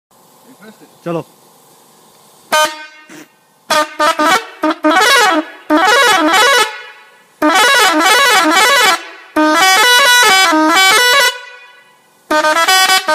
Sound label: Pakistani Horn